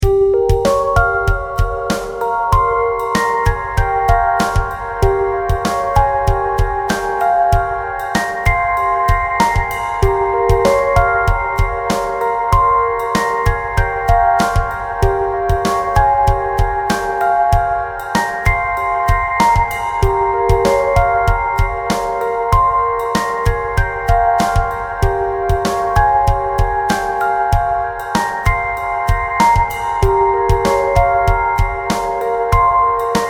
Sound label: beat; moh samples